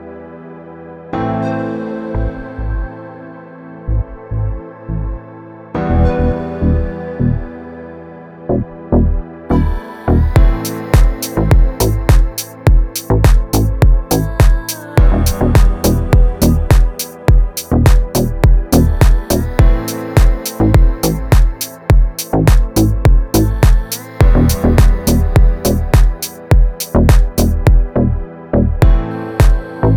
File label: Instrumental